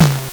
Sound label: LSDj, Nintendo Gameboy; 300 Drum Machines